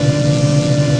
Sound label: jet engine